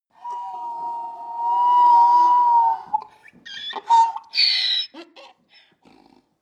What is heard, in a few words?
donkey bray slow